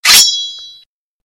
sword notification; ringtones

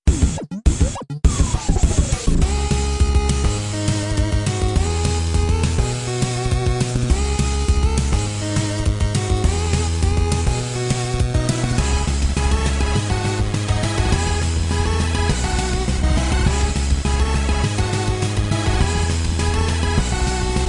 Hardcorner 8Bit

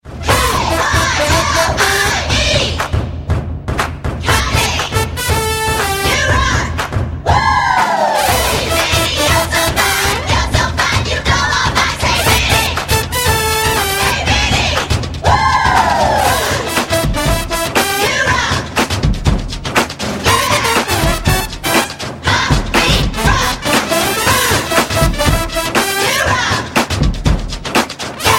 heart beat mix

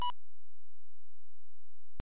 Beep

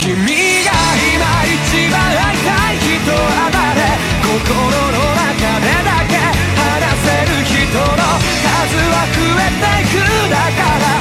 new song segment

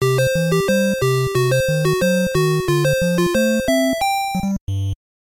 Stage Clear

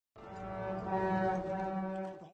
horn; horn of gondor

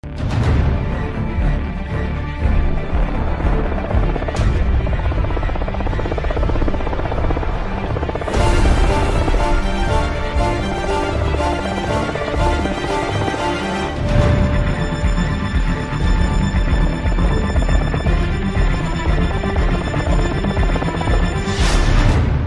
Theme; Reverse Flash

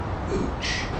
PAIN OOCH

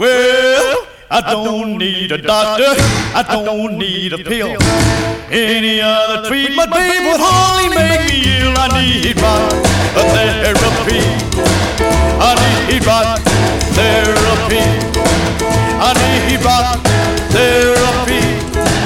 Retro Rock

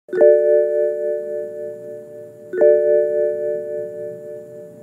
seat belt tone bmw

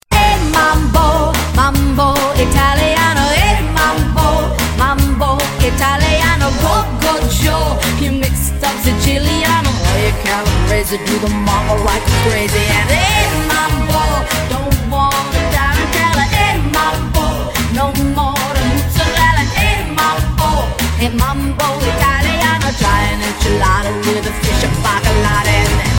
mambo; animals

